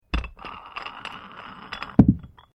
Weights Dumbell Loosen Remove Screw, Gym weight lifting equipment; Weightlifting Sound Effects; Sports